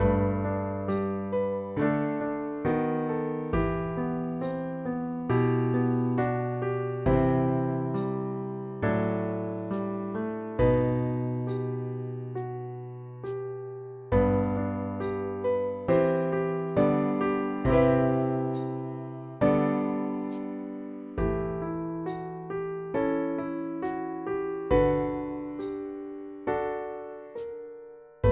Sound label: BGM Church; the letter a horror visual novel switch gamerip 2021